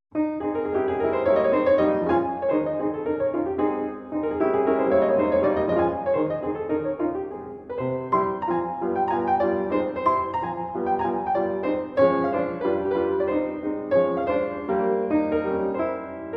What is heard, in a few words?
Mazurka in A flat major; Op 7 No 4